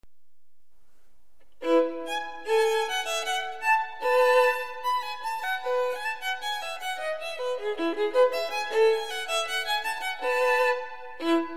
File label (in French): Gavotte en Rondeau; BWV 1006; Ensemble FULBERT; Chartres Millénaire 1006-2006; cathédrale